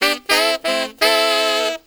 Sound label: SAX, W.AFR.SAX; Spectrasonics; Heart of Africa